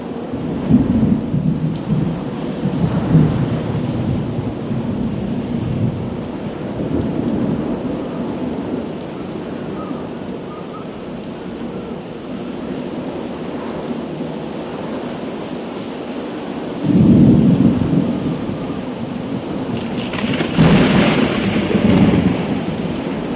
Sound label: ambient wind thunder; Moshi Monsters Moshlings Theme Park; 3DS